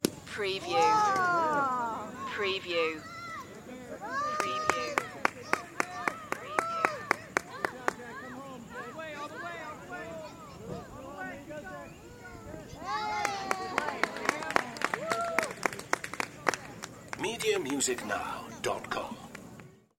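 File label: Little League Hit Clap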